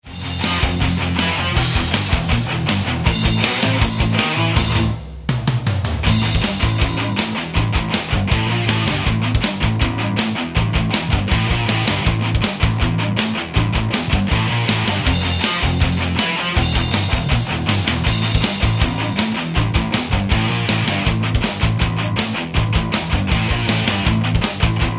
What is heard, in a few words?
metal